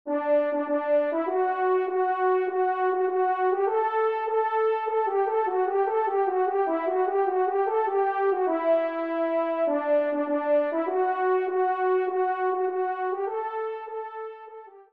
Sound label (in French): Tambourin Do Maj; 1e Tambourin; Corrette